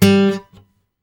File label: GUI Ac. G, GUIT AC; Best Service Gigapack 2